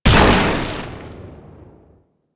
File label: Experiment Pistol Shot; Crp Sent Experimental Pistol